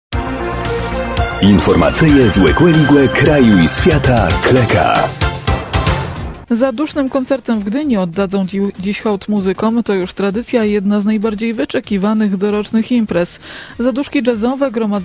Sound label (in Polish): jazz; zaduszki